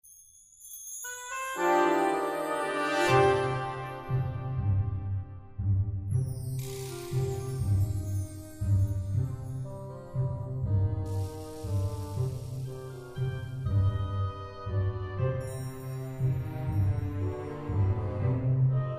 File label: adventurous